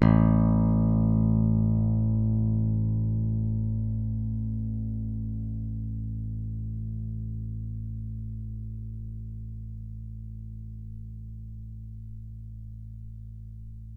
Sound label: fat beam pick, bass